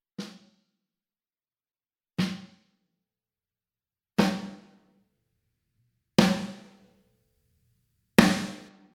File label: Snares